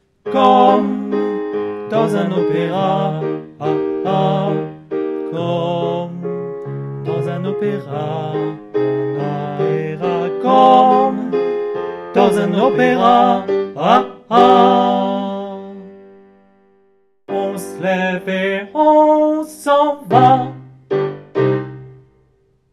Coda Tutti

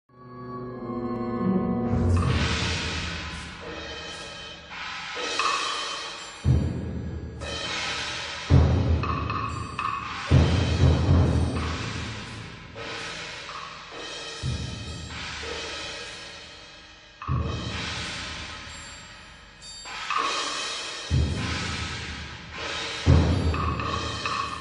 Autres percussions; Turangalîla

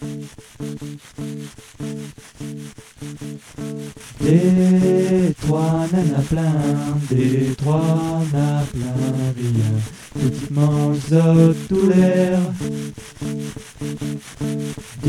Manger Couplet

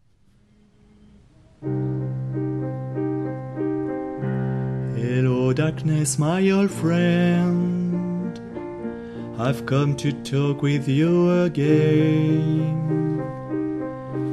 Voix 2

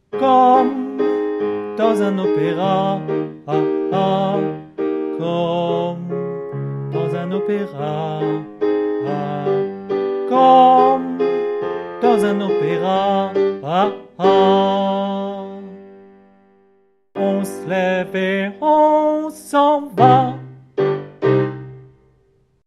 Coda Voix

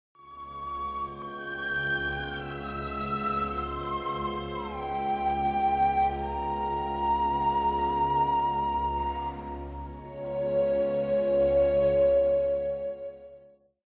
Ondes Martenot Ruban

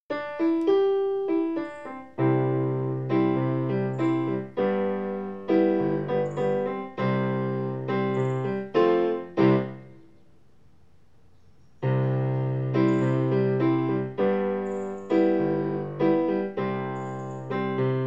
piano tempo lent

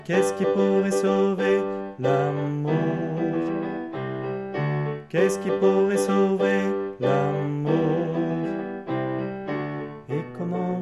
Refrain Alto

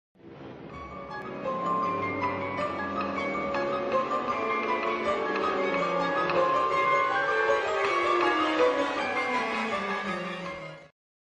Célesta

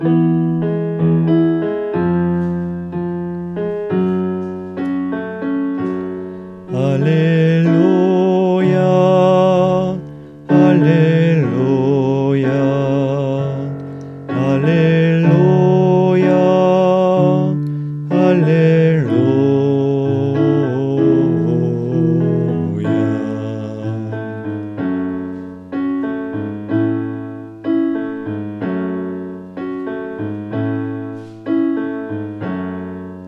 Refrain Voix

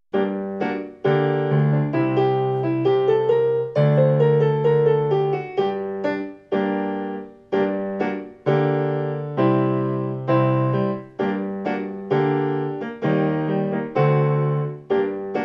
Tempo modéré

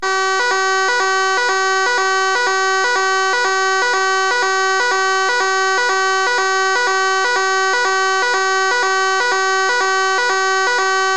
Alarme Incendie; 2.Alarme Incendie

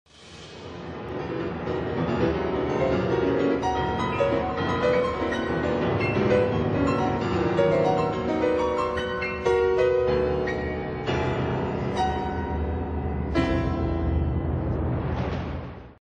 Piano soliste

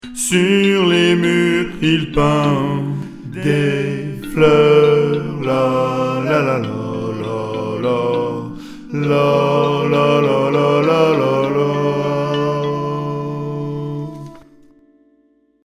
berlin ouest coda voix 1

